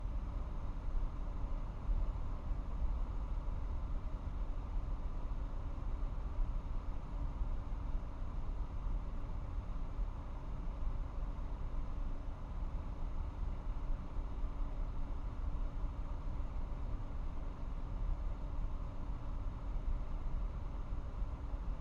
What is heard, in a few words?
Ventilation Fan, small box, metal, electric motor, disconnected, whirring, spinning, low intensity; exterior; Eiravaein Works; Vaeyan IV